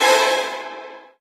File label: Stab; friday the 13th killer puzzle